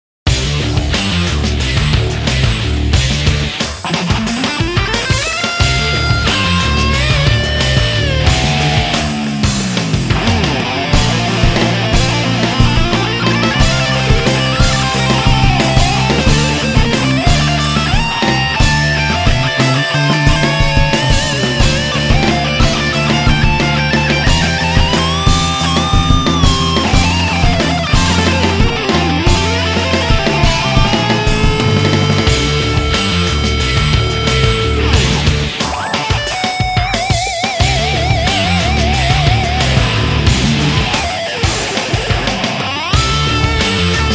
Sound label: Heavy Groove